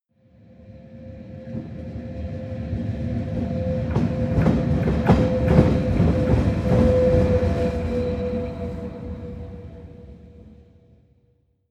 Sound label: train locomotive drive by hum